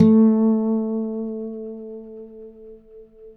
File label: Finger A 3 L, Guitars; Spectrasonics; Hans Zimmer Guitars VOL-1